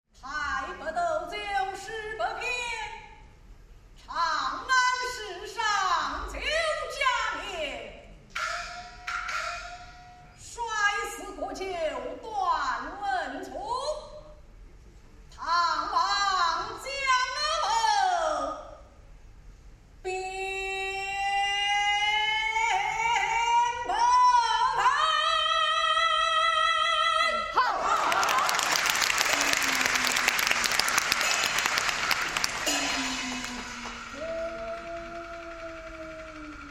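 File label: Ambience Shanghai Stage; YiFu; A.Hen.Pecked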